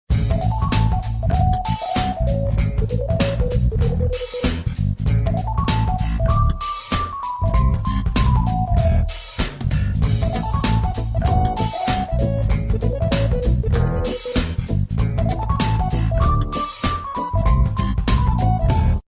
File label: Note Block Old